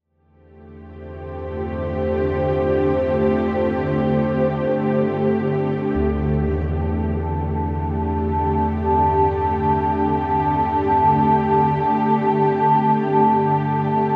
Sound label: Relaxation Music for Qi Gong